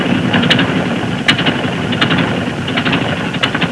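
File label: old train, treni; trasporti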